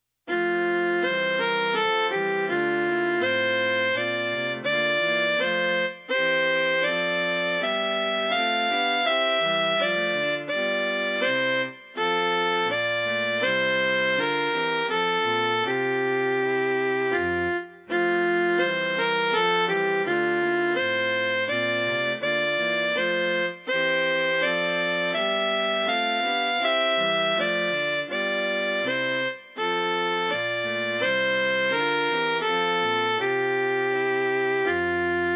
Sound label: soprano